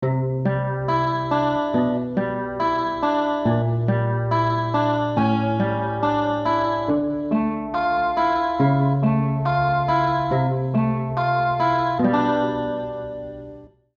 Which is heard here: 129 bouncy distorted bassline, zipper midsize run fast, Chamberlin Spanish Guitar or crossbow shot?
Chamberlin Spanish Guitar